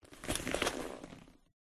leather jacket foley leather jacket movement medium short; silverplatter audio